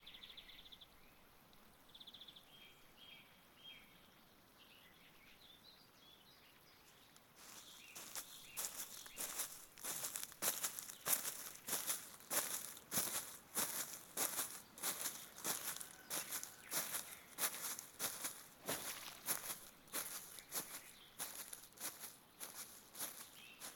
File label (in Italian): AMB Vertia; BLIND QUEST - The Enchanted Castle